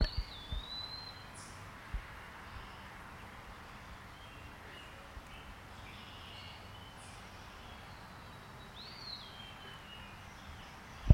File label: peewee